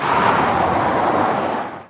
skid dirt long; Blind Drive

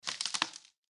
Willow Tree; Blind Quest - The Frost Demon

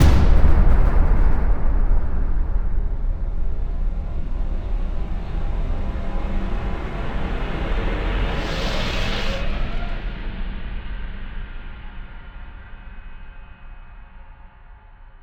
sting, Cinematic Metal Impacts; opening credits ahoy; boom library

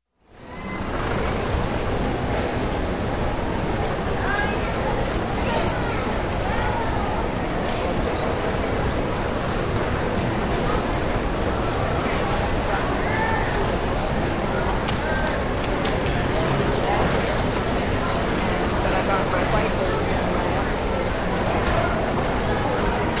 Store, Department In Large Atrium, voices, Foot; Sound Ideas 2000 Series

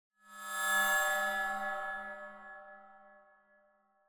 Cymbal Metallic Tone Quick Passing Tension; Hollywood Edge; sound designer's toolkit v2

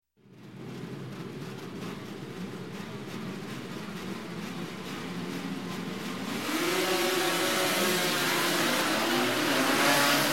Motorcycle, Race 600cc Production Bikes Start; Start Of Race; Sound Ideas; Series 2000

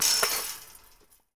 Street Ball Metal Rim Chain, Street Basketball

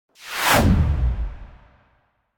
Whoosh Big Ending Air Transition - Short; Hollywood Edge; sound designer's toolkit v2

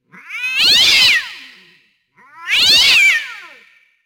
Cat Scream; Halloween Havoc - Sound Effects; Ktel

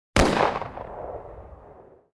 gun hand gun; NCH Library

